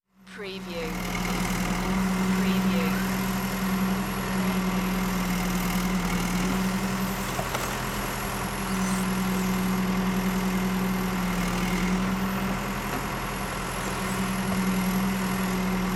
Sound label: Hi Lo Operate Engine